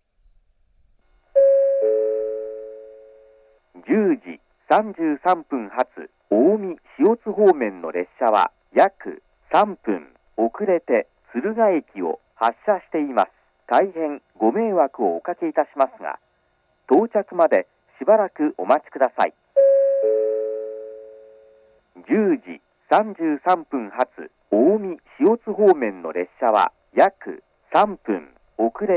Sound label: delay 3 minutes, station; shin-hikida